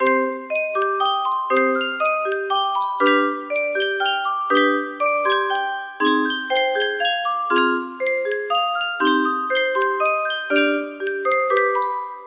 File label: music box theme